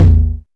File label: deep tom sound d sharp key; tom drum